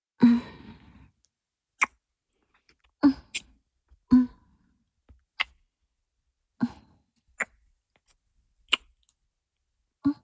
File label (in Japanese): キス(弱):舌先を絡めるキス, チュパ音; チュパ音抜粋集